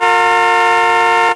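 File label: auto medium car horn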